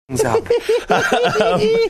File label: cute laugh